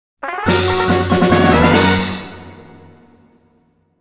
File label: Fanfare - Jackpot